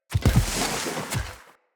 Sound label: Corrupted Battle Dog Charge Attack Foley